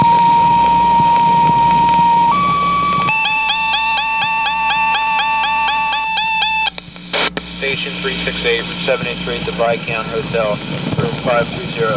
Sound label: Plectron